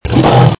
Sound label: hurt; Predator Mod